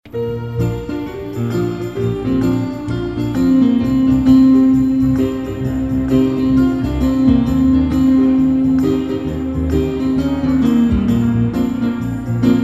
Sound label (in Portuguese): Piano e Cordas